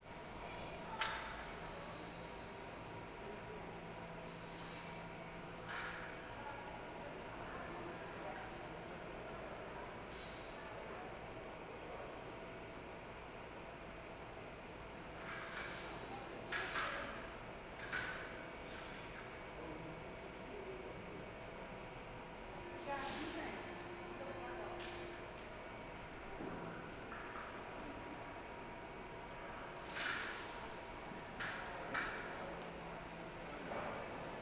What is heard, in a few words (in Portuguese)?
Estacao metro vozes barulhos catraca beeps